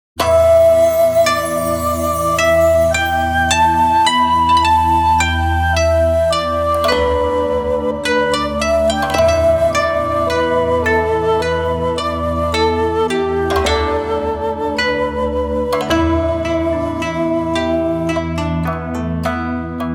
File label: asian traditional zen